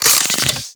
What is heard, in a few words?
Sidearm Reload; Warframe; Weapons; Tenno; Wraith